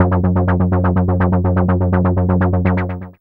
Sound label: SYSTEMAT F#3, Analog Rhythm; E-MU Producer Series Vol. 6 – Analogia Project (CD 1)